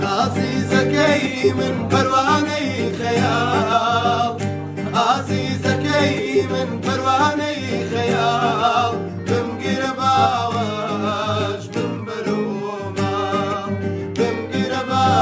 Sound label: Guitar